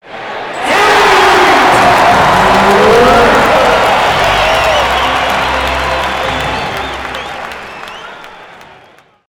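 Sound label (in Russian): реакция толпы на переход звуковых эффектов видео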